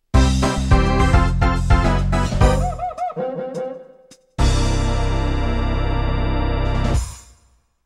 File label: No Trophy Finish Line Jingle